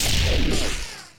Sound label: shoot, weapons; flakhandgun; gmodtower; virus